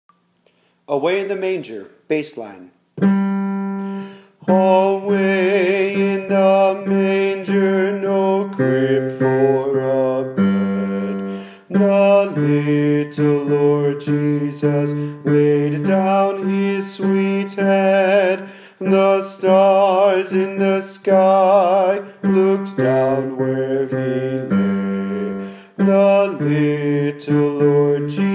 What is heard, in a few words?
bass away in the manger